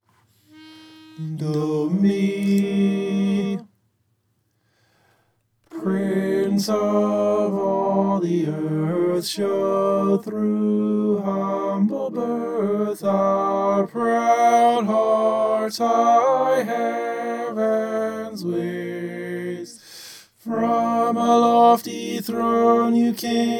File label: prince of all the earth tenor